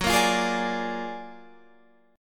Gb Augmented Major 9th Guitar Standard; Gb+M9 strummed; JGuitar

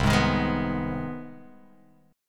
C Minor Major 7th Sharp 5th C down Guitar Open F; strummed; JGuitar

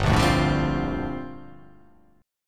G Minor 11th, chord; Gm11 strummed; JGuitar

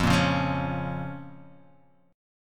F Suspended 4th Sharp 5th F down; strummed; JGuitar

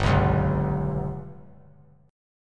G Major Flat 5th chord; GMb5 strummed; JGuitar

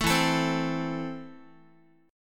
E Suspended 4th Sharp 5th, Guitar, Esus4#5 chord

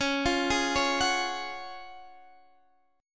Gb Major 7th Suspended 2nd

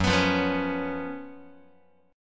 Gb Major 7th Suspended 4th, down, Guitar; strummed; x,2,2,3,3,0; 4A,4E,5A,5D,5G#,6C#; JGuitar

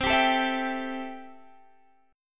C 6th C down Guitar Standard; C6 strummed

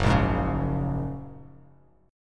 Gb Major Add 9th, down, Bass Standard; strummed; JGuitar

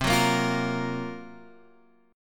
Gb Major 7th Flat 5th

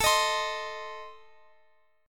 A Diminished, down Guitar Open F; strummed

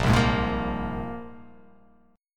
A sharp 7th Suspended 2nd, guitar chord

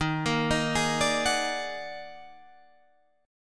Eb Minor Major 11th, Guitar, Drop D

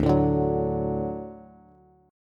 D Major D down Bass; D strummed; JGuitar

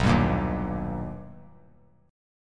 B Augmented Major 7th B, chord; strummed; JGuitar

